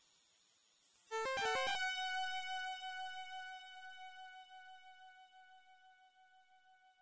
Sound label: Tinkle of Rings; Jewel Master; Genesis